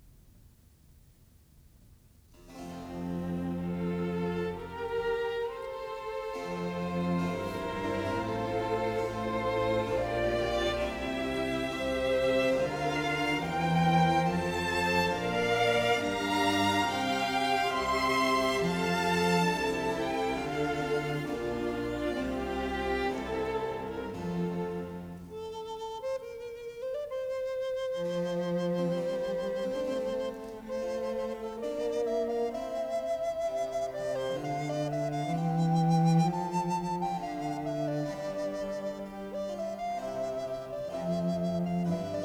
Recorder Concerto; Handel; Op4-5; Marriner

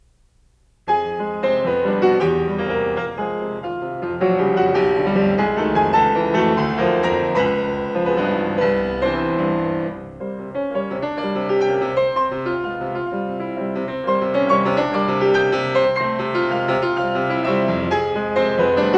Carnaval for piano; Schumann; Op9

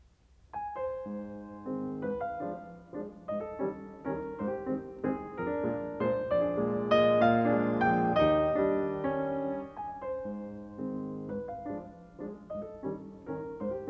Carnaval for piano; Schumann; Op9; Rubinstein

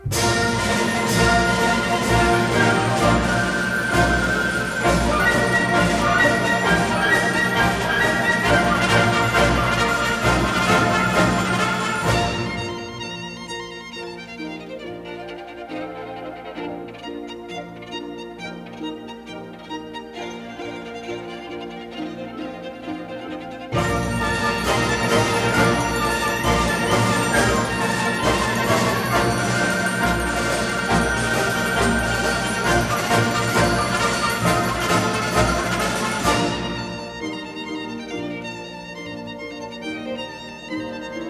Capriccio espagnol; R Korsakov; Kondrashin

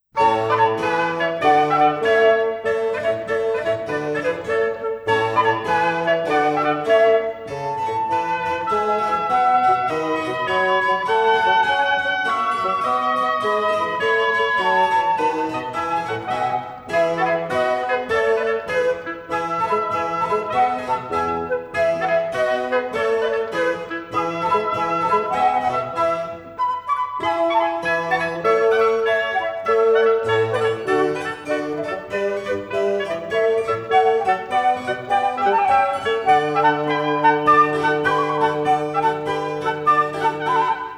Quartet in A; Telemann; TWV42-a2

